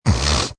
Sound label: Snort Single, Snorting, male, short, with conviction (human voice); Sound Effects:Human; VoiceBox